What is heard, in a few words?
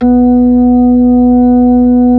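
B-3, Hammond B-3 organ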